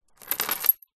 household jewelry necklace silver charms pick up from table; zapsplat